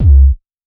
wet bass drum single hit c key

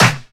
trebly snare single hit a sharp key; snare drum samples

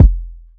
reverb kickdrum sample f key; kick drum samples

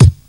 thick kick c key

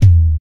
clear tom drum e key; tom drum samples

